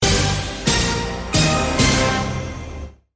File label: music stinger